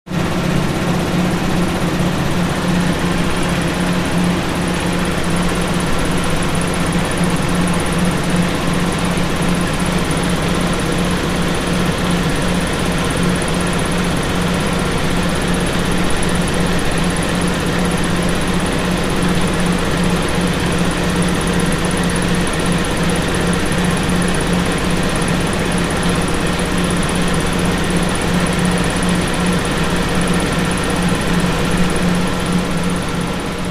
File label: Generator Large Mot, factory